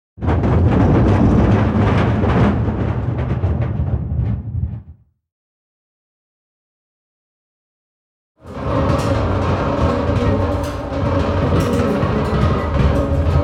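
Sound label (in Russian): бочка катится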